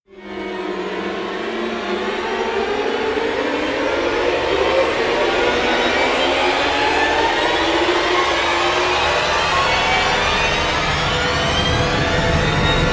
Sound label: countdown riser; heroes of the storm full gamerip 2015